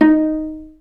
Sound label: Vla Piz Eb3; Digital Sound Factory Virtuoso